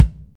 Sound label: low tone kick single shot a sharp key, bass drum sound tuned to the A# note; kick drum samples